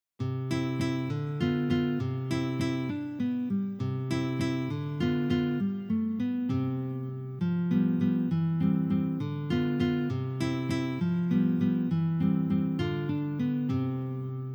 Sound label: Walse; Carulli-Ferdinando